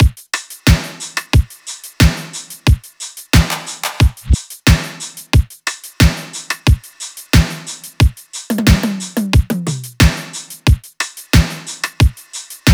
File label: drums 90; Drum Loops; Full Drums; GTX; Slate; MIDNIGHT Sample Pack